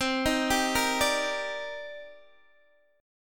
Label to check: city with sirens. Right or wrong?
Wrong